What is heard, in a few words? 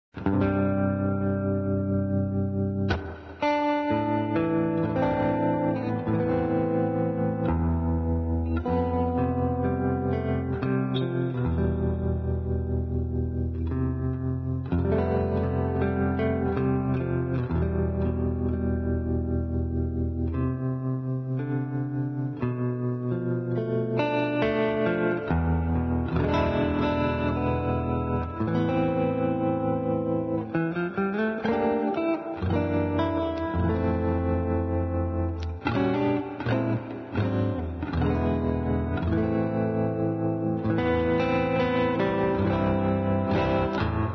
Guitar Electric; Zomboid; Lifestyle